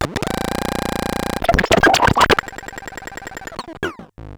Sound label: Bent Talking Teacher, Circuit Bent